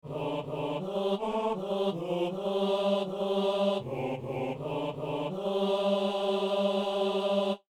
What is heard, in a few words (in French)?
psaume, hommes